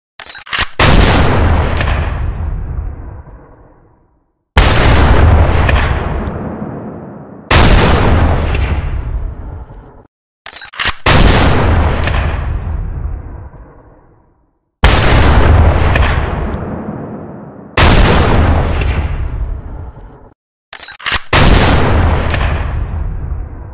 Tieng sung barret m99

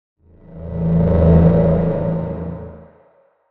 Am thanh su linh cam chuyen xau ngan

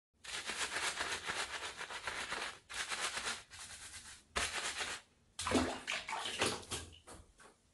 tieng goi dau sot soat

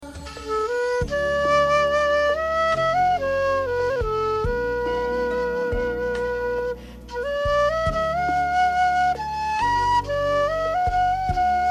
Nhạc chuông tiếng sáo thổi

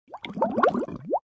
Tieng nuoc sui bot

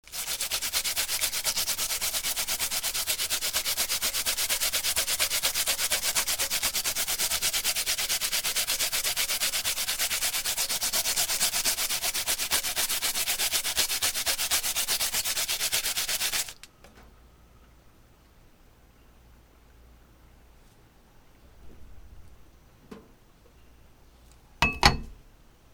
Tieng mai dao bep

